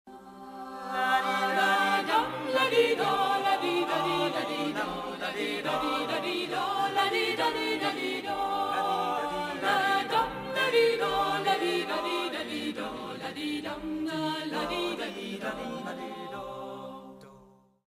Swedish walking song

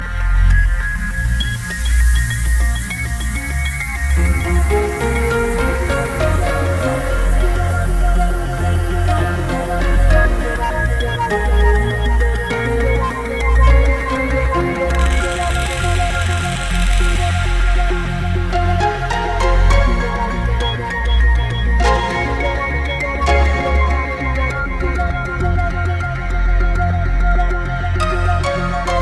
Buisness Ring; meloboom

